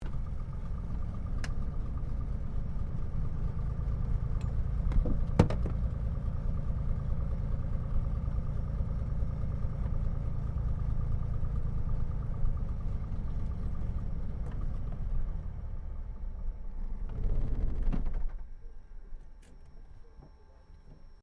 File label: AIRCRAFT CT4A SHUTDOWN INTERIOR, military trainer aircraft; Airplanes (Sound Effects:Vehicles)